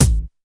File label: Boing Kick, Dance